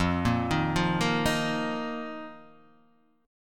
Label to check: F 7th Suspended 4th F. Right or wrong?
Right